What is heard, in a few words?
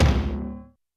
Tom Industrial, YAMAHA RM1X